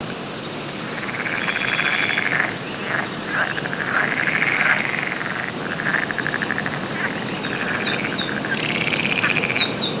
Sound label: nimbus forest surround, ambience